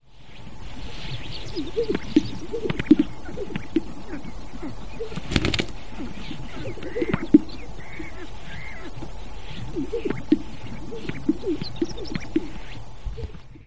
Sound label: Greater Sage Grouse, Lek CO; Coalmont Lek